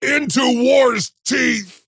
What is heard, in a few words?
move pain; dota; axe